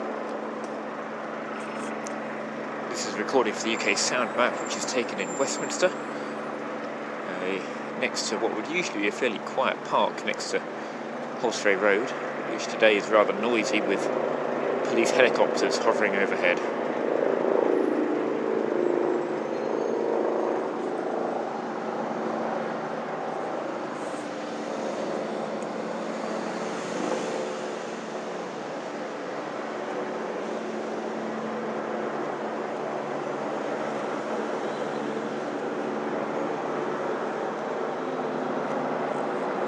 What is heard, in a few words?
helicopters over westminster; uksm